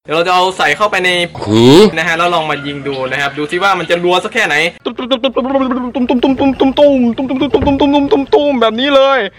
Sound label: killspree; ff2